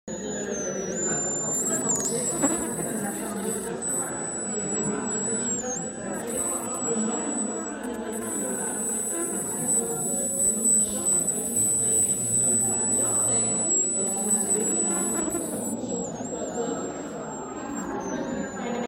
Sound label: buzz; exposition estivale intitulee bestioles representations animalieres